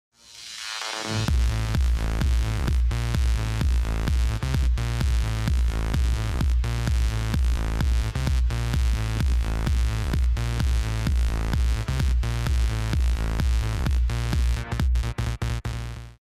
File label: rhythmic beat